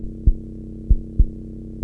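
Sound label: heart beat; quake2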